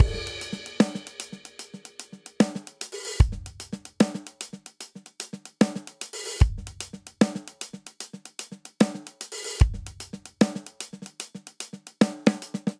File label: Triplet Hip Hop Intro 75 bpm, Drum; 4 4; MIDI Clips; Ableton; Factory Packs; Drum Booth